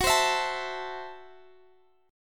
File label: F sharp Minor 7th Flat 5th, Guitar Open G; chordshape; down